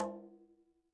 KILLER CONGA

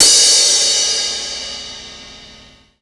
Crash, Drum Hits; breakcore; breaks

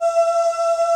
aahs; Greytsounds; F2-SYNCLAV 2

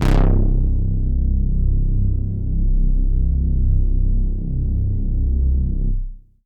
Synth Prophet Bass C; Electronisounds

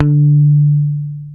PICK D 4, Bass, ROCK PICK; Spectrasonics; Bass Legends

